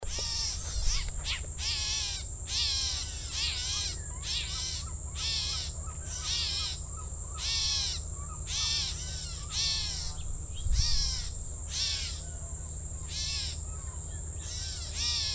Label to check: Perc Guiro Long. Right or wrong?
Wrong